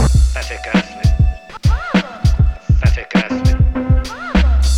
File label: jazz, breaks breakcore